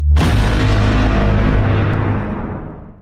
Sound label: mothership laser boom; astro